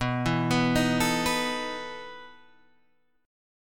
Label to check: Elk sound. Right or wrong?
Wrong